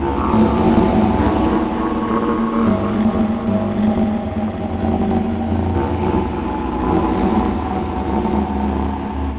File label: Spectral Groanings; Sonic Foundry (Sony Creative Software); Loop Noir